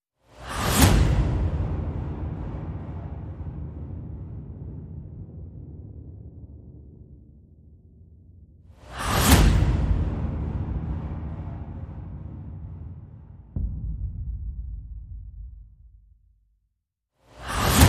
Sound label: Perc Only